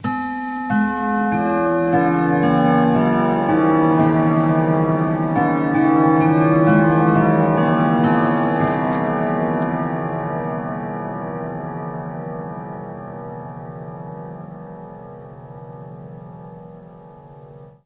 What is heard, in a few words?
Clock Grandfather